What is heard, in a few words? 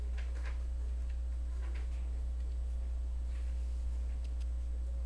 toy